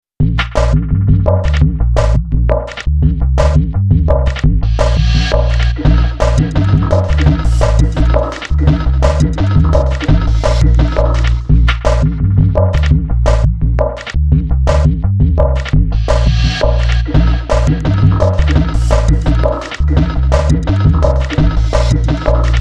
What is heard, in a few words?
dubness sound